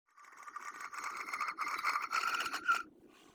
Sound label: warped tonal